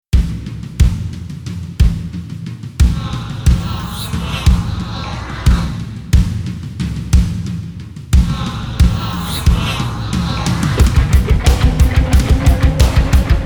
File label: no choir